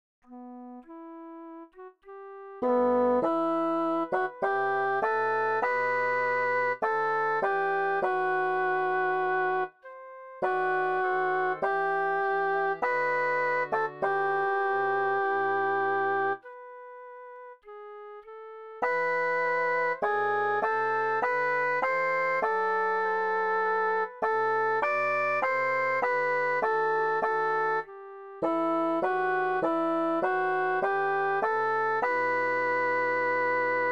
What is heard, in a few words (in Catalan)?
ocells, sin voz